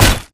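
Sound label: impact bullet light ballistic android; Titanfall 2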